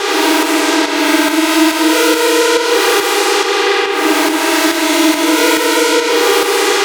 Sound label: Antiharmon, hard style